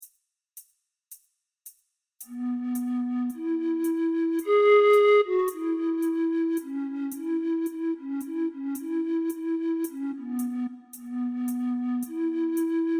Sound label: Karaoke All Night All Day